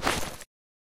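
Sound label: binoculars draw; STALKER; IWP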